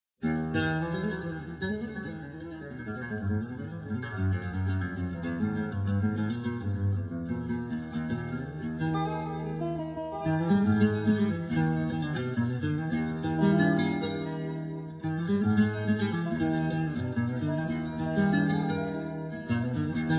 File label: Rumba, bellydance music